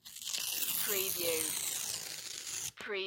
paper rip

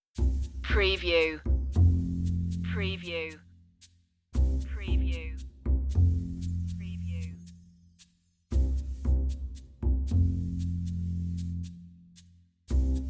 bass and perc only

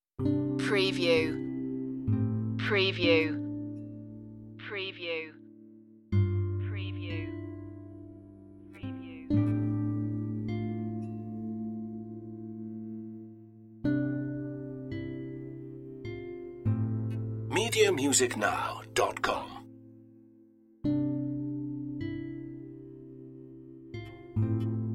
brushstrokes longing

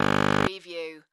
Multimedia Alarm

